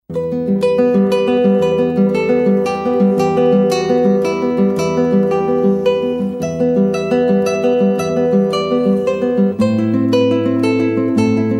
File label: Guitar